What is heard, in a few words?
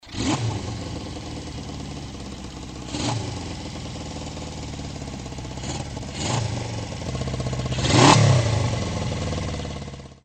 350 four, motos